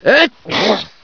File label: sneeze, scientist